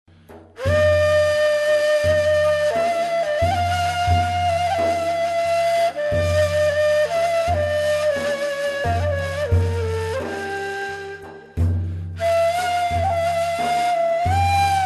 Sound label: Ney, Makam Ferahfeza Pesrev